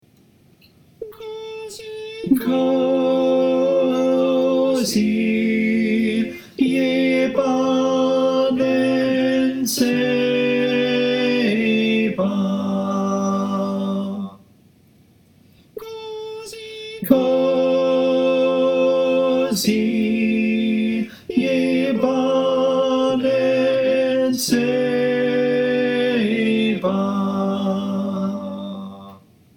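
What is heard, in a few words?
tenor, choir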